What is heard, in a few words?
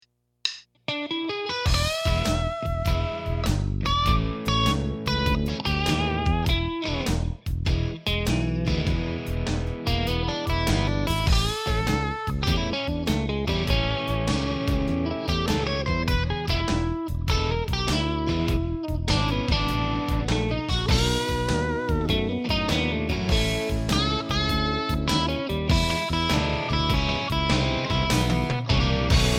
Blues Scales, guitar; guitar chords scales tuning